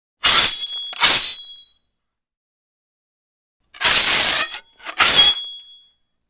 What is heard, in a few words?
metal shwing; Asian Show Sound Effects